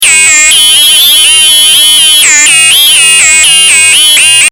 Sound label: fm synths